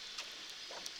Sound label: Background Noise Clips